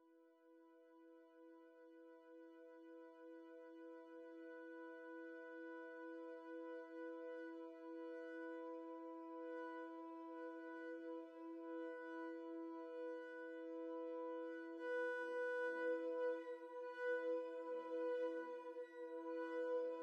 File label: pad ceramic E maj; base pack